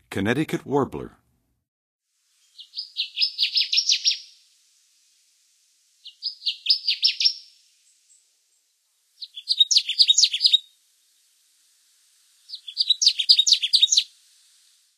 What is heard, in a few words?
Connecticut Warbler; Western USA Songbirds; N. America; Songs of Birds of North America